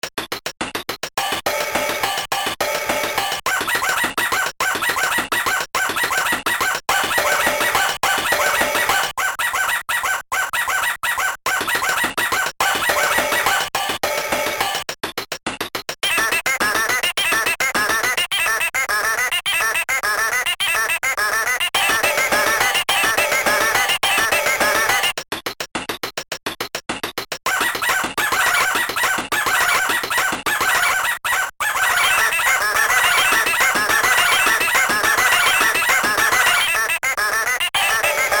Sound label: beat breakdown